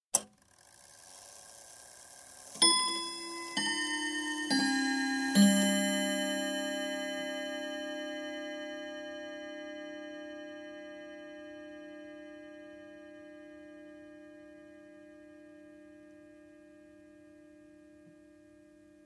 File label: Domestic chiming clock striking quarter past the hour; Chime Sound Effects; BBC